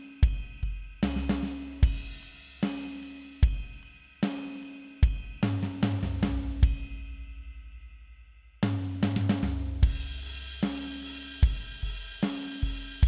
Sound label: drum